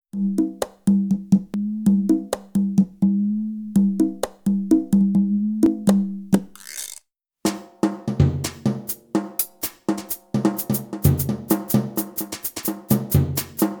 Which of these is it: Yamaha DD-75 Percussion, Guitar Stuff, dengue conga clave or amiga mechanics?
Yamaha DD-75 Percussion